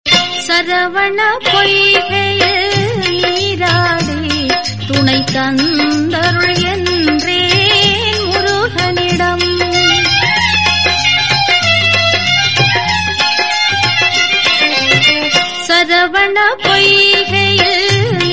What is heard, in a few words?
devotional songs